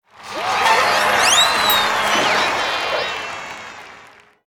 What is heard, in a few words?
Applause; Toontown Online